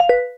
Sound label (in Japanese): ブザー; LcdDem SFX